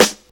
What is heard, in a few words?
airy steel snare drum g sharp key; sample